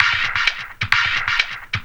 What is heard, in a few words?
130 addon, DRUMLOOPS, HIHAT; Ueberschall; Techno Trance Essentials; LOOP ADDON3